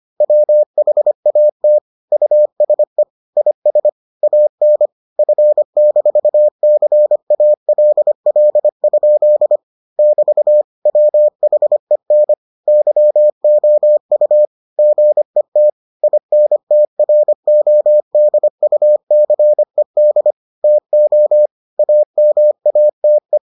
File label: morse